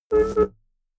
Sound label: notification synth wobble once, signale